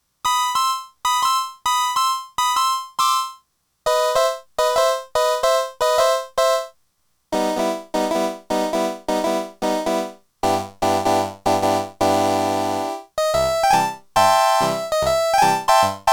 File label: Honky Tonk, Multivox MX-20